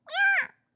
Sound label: meow, Catgirl; Catgirl Fighting SFX